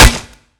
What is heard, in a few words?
new hit; slender; cof